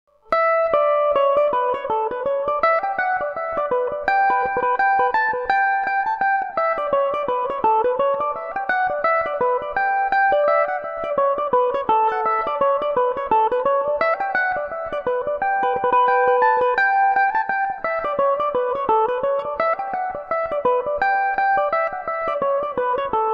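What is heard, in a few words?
A mixolydian revelation, Irish mandolin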